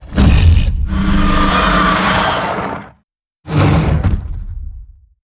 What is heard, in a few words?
Monster Spawn Initial